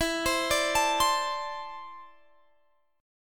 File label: E 7th Suspended 4th Sharp 5th, down Guitar Drop D